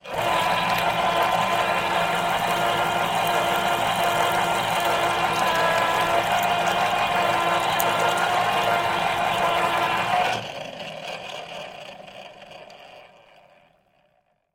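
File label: blender and juicer sounds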